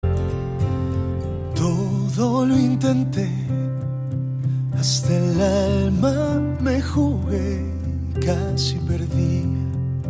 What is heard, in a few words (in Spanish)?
en vivo